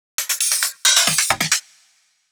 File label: EDM Drum Fill; FL PRO